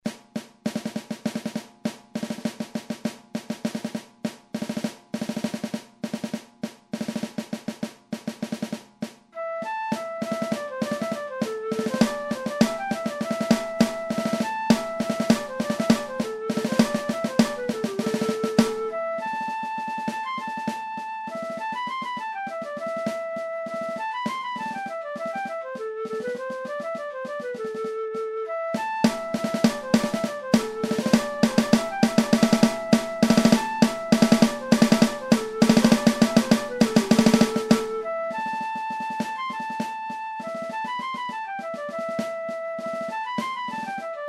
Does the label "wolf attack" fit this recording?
No